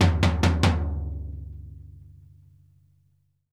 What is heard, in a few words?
FLOOR 8THS, TOMS; Sampleheads; New York City Drumworks VOL-1; SP TOMS